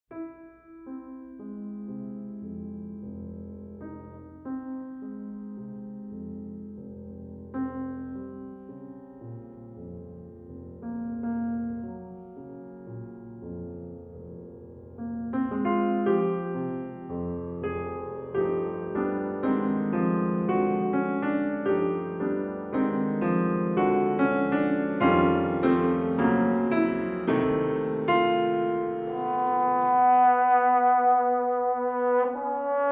Sonata In D Minor For Cello And Piano Adagio Ma Non Troppo Andante Con